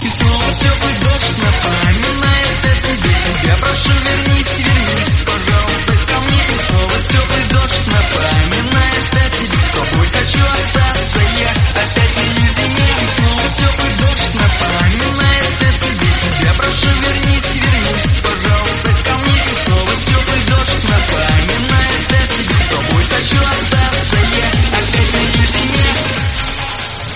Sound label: Ringtone